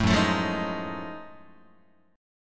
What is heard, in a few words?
F sharp Minor Major 13th F sharp down Guitar; strummed